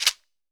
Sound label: CHEKERE; AKAI S-Series CD-ROM Sound Library VOL-5